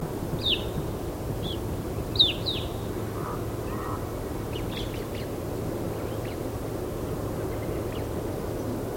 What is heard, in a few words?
Evening Grosbeak Hesperiphona vespertina Type 1